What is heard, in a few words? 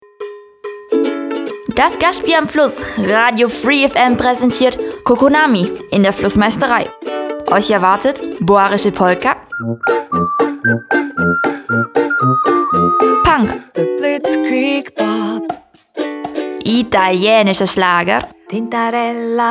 jingle